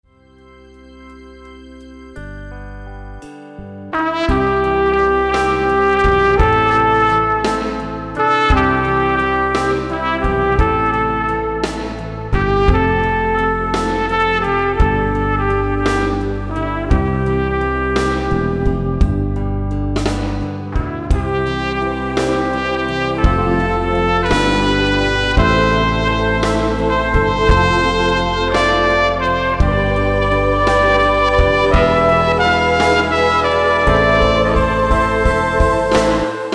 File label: Hallelujah Trumpet